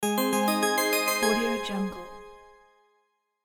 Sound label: magical game win